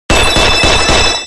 emoticon

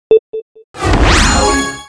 ready

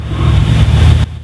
GAS

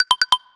alarm

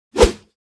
ATTACK JUMP

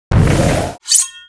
ATTACK

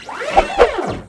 ATTACK

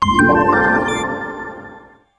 music stone yes

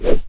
attack engineer

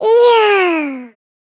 RACCOON DOG

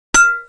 ENCHANT PROGRESS

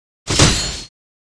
ATTACK JUMP

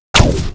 ice fire arrow

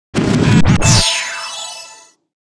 YOU WIN

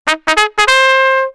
user congratulation

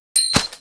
UNLOCK

